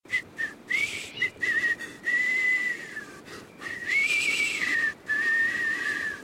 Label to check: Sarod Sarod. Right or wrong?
Wrong